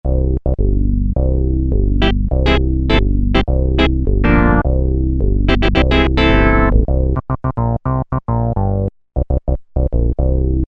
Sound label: Owgan, Roland Juno-106; Factory Preset